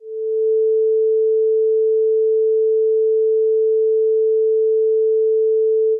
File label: sine 440Hz